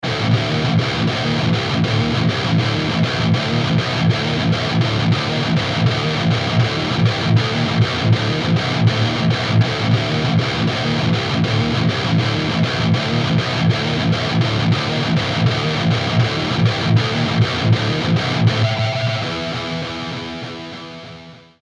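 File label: guitar rectifier 60W; mesa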